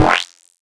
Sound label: reso frog